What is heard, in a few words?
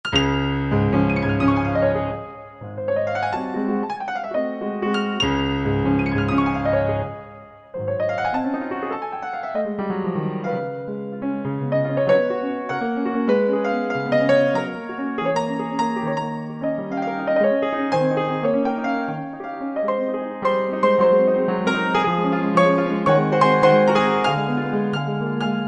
nord stage